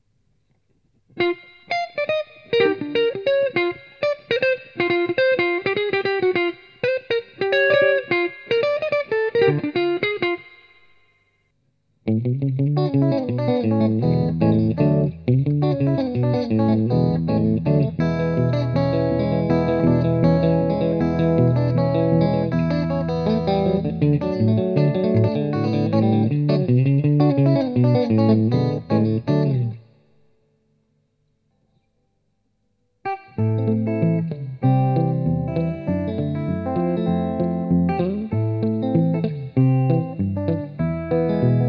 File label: Mini Buckers, guitar pickups; TBarr